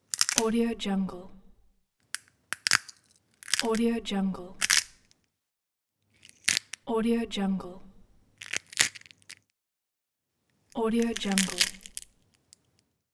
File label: breaking bones